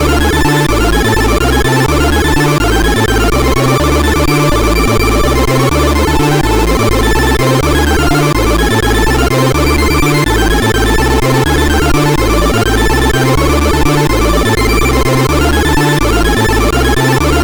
Music, CSG8580R5

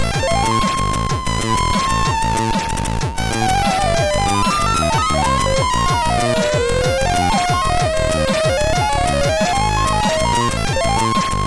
sid MOS6581R3

